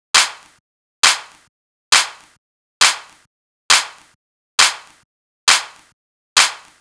Clap Loop 135, Techno

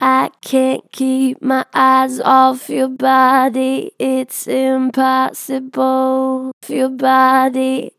Strong Vibrato, Natural Vibrato Strong, Vocals; I cant keep my eyes off your body; Harmor Vocals

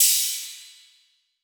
Cymbal; CatchUp; Cymatics; Drum One Shots; Cymbals

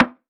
Percussion; Modern Drum Kit; Guard

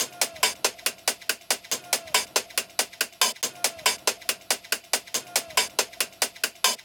Hihat Loop, 140 BPM; Go; Cymatics; King Drum Loops

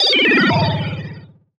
Retro Portal Crossing FX; Cymatics; Video Game Sample Pack Vol 1

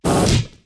Jolt Standard

